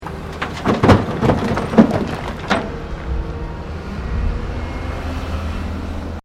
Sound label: Construction Earthmover Unloading Into Dumptruck; Various construction machinery